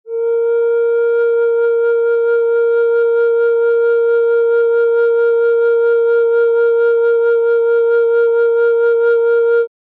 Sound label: Medium B Flat, Flutes Single Note